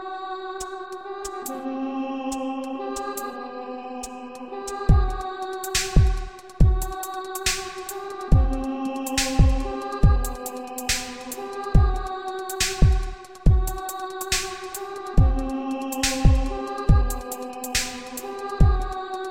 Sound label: dubstep, ringtone